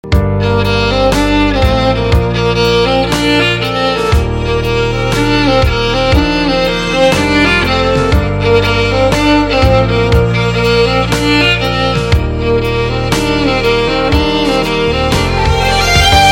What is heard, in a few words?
VIOLIN RINGTONE